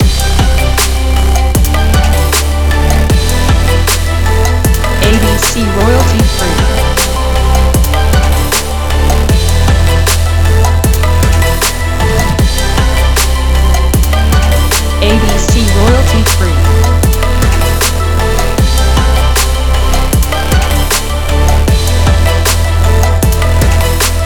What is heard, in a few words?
Future bass